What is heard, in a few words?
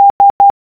S morse code